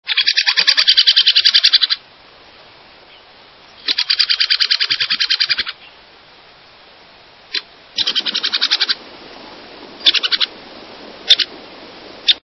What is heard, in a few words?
Ladder backed Woodpecker